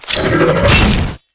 attack

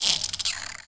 Scorpion Attack

